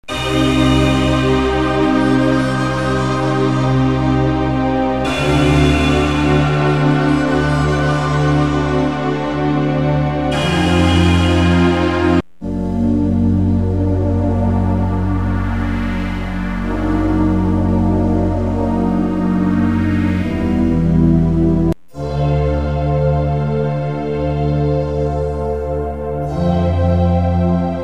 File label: Generalmusic S2R, the PAD synth